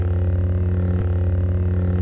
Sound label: saber loop